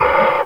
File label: idle, fish